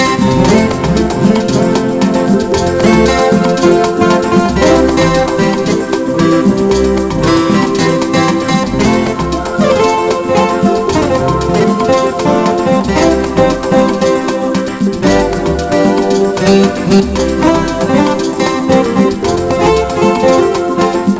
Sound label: Enjoying Southern Sounds